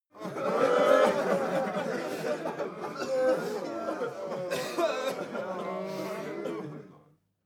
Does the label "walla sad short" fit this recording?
Yes